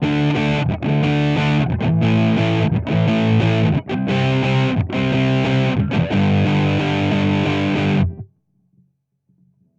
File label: Amplitube X-Drive Big Muff Cab Sim